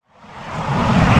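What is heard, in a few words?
monster chair slide; Birthday Party Bash; Wii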